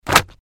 丢东西

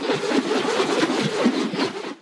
榴莲滚; 武器音效

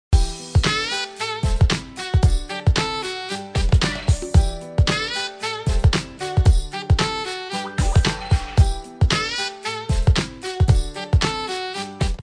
点击音乐

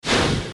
水灭火

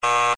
放置错误

